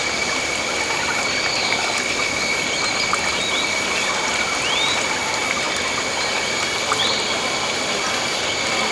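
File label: ambience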